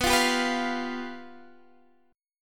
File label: F Suspended 2nd Flat 5th B